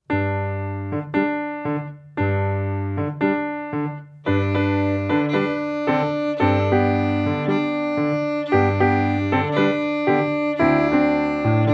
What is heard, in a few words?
Tango